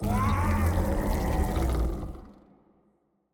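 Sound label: Xiphactinus death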